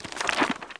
daVinci Recycle

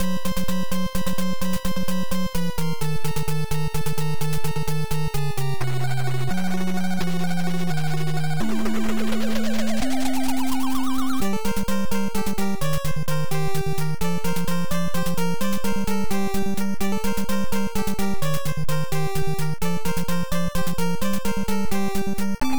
Cave; Tenchi o Kurau II Shokatsu Koumei Den; Family Computer

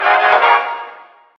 Card Normal Stinger

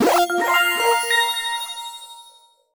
Collectible; The Adventure Pals

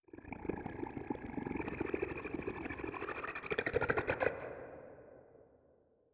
Creature

